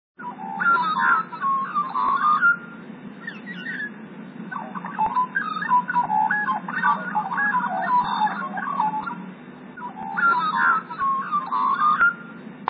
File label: magpie group